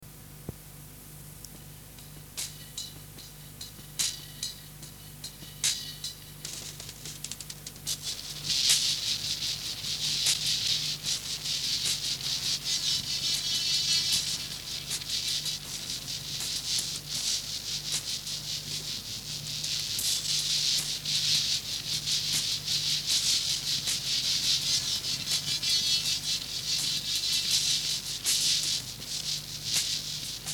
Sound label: instrumental